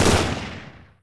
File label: oicw fire, weapons